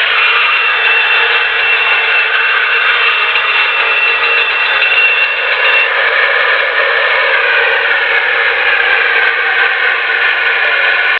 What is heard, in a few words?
fire; gadgets; The BATOSTAT ANTI-FIRE ACTIVATOR; 20th Century/Fox Special Effect sounds